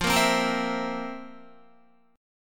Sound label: F Major 7th Suspended 4th F, Guitar; strummed